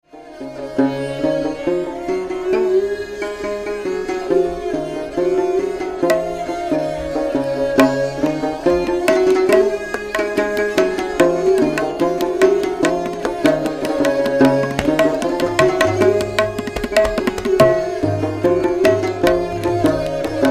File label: Strings Instrumental